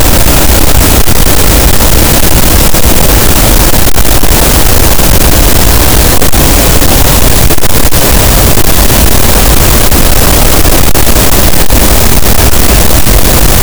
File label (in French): cachalot, gaussian noise; Physeter Macrocephalus